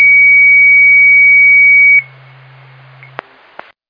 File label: Siren; Lafayette FD